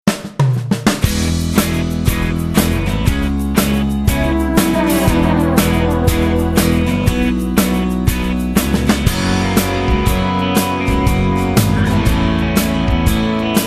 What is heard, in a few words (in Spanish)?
Corporativo, Musica